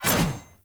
Sword Whoosh Heavy; Weapons; Player; Swords; Garren Sword

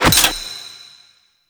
Token Insert; DE; Warframe; Greed Token Menu

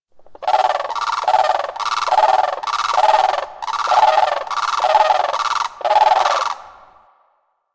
Forest Bird Talk; Ambience; Warframe; Grineer Forest

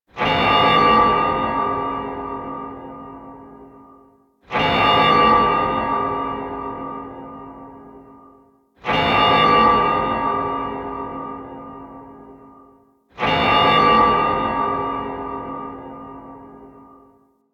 Alarm A, Ambience Interior; Warframe; Orokin

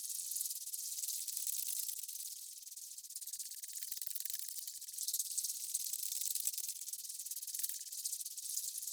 Corrupted Ode Critter Loop

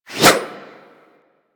Axe Small Swing; Warframe; Weapons

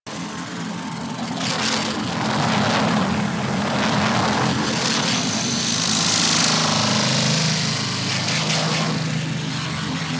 Electrical Large Loop; Props; Warframe; Ambience; Sentient Devourer

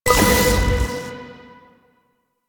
Hack Minigame Click After Hold B

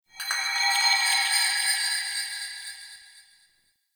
Magic Bells; Midrath Island; Fey Hub

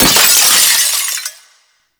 Generic Glass Break

